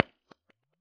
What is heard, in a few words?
Science 2x15 15PR400 U87, Bass Cab IRs